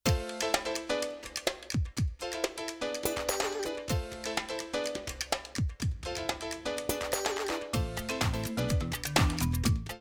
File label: Music; Hollywood Divas S2; Tom Joyner; Tom Joyner Cruise